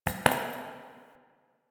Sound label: Idle Single Step; Tagfer